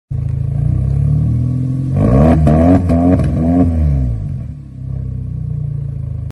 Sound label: GAC EMPOW; اگزوز